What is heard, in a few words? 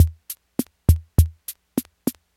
rock, rhythm, Omnichord; OmnichordGodot